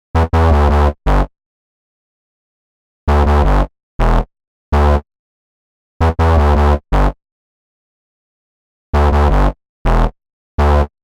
Bass AB Bridge

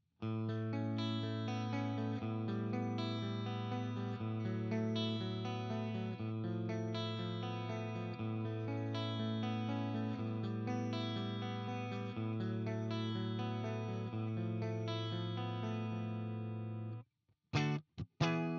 HB Fusion-T clean both split

